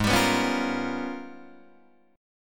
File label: Ab Minor Major 13th, down, Guitar, DADGAD